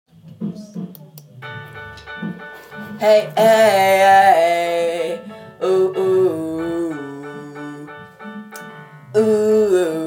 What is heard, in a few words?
drums singing